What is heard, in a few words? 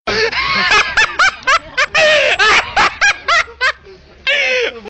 Funny Laugh